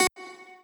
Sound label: lead